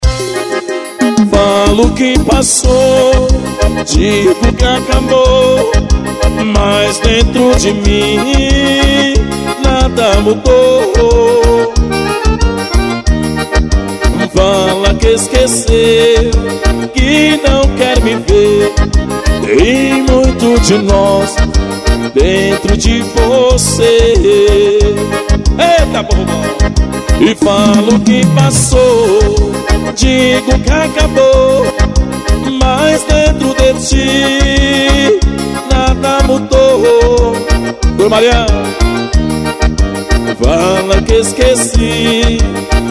ao vivo na festa; festa de sao marcelino champagnat

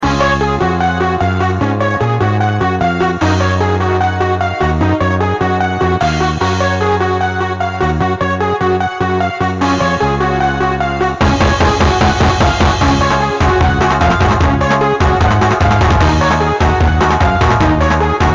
ritmos profissionais

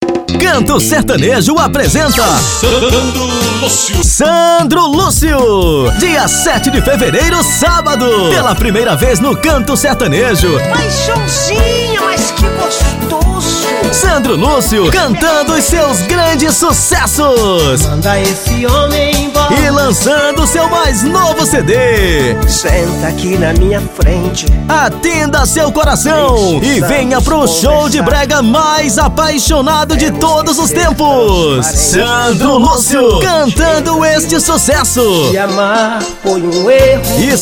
canto sertanejo

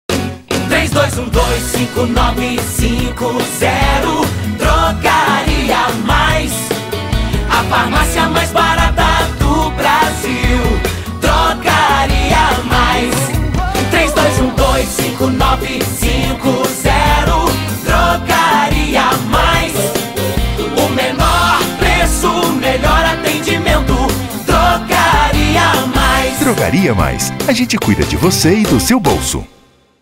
jingle drogaria; drogaria mais

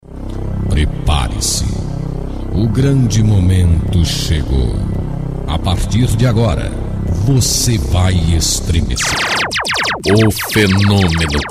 vinheta abertura; o melhor do brega

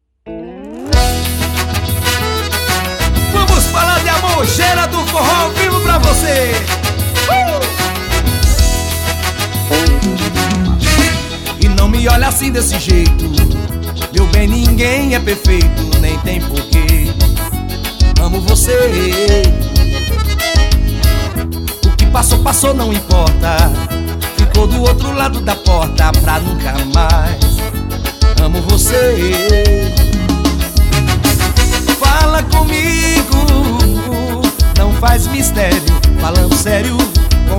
forro

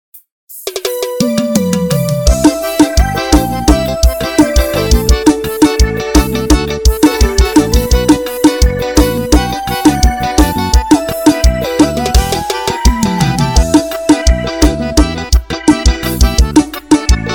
playback, damiao teclado

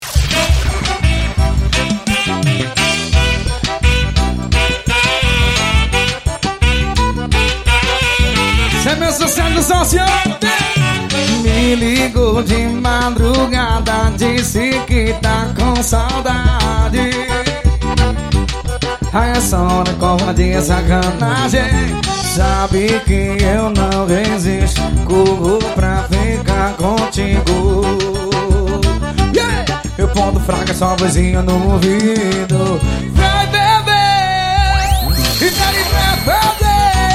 forró

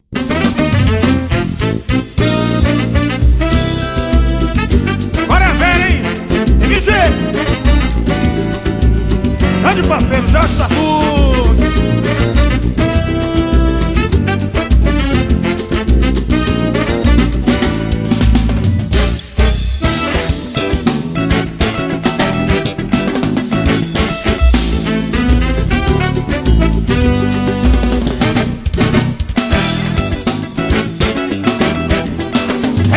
abertura

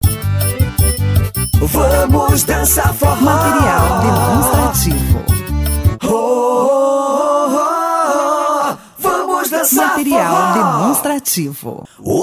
banco de voz vinhetas cantadas para radio capelas em ritimo junino; sao joao

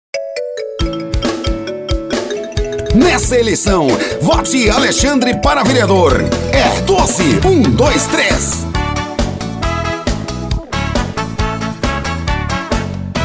jingle vereador

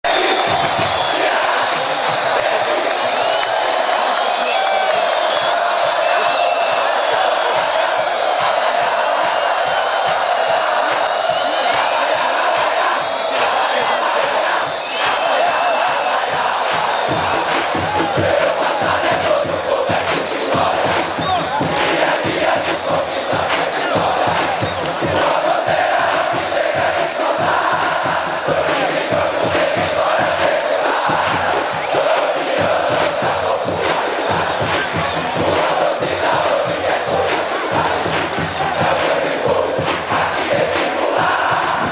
torcidas cantando o hino do ceara sporting club

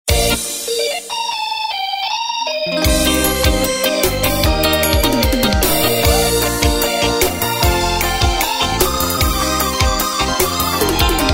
brega

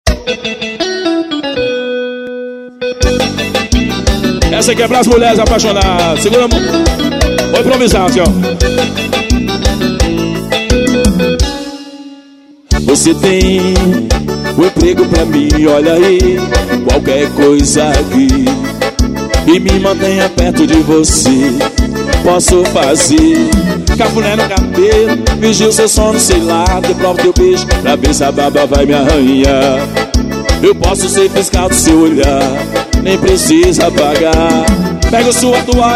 promocional ao vivo na festa; festa de sao marcelino champagnat

